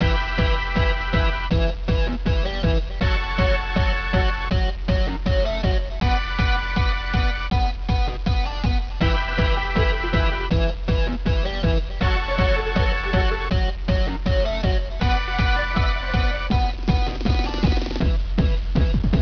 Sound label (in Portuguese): Musica